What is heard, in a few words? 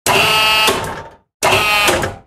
Idle Muzzle